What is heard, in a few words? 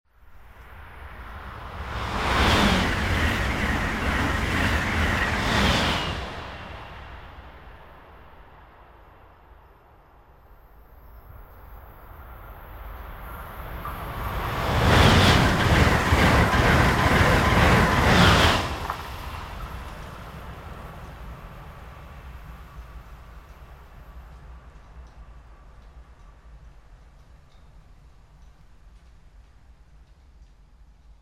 TRAINS ACELA